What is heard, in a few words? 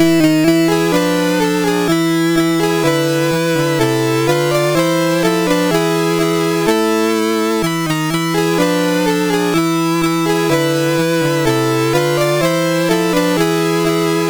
Game Over, MOS6581R3